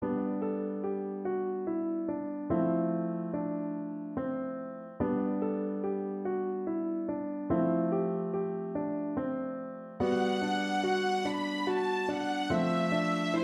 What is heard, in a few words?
Chanson Violin Piano